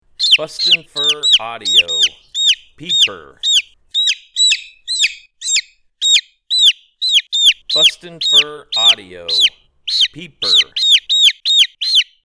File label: Peeper